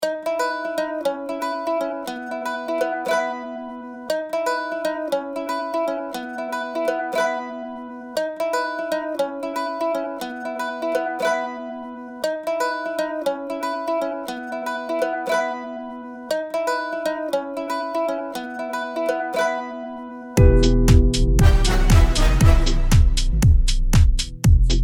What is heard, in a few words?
instrumental